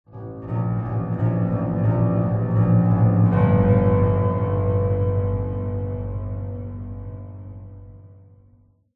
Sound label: Atmospheric Mystery Piano